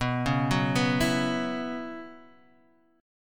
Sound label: B Suspended 2nd Suspended 4th, Guitar, Half Step Down